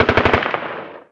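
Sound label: gun m16 distant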